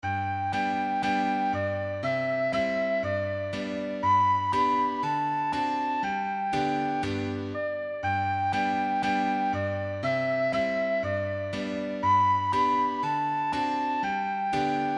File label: old macdonald, recorder